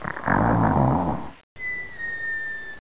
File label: digital snore, electronic sounds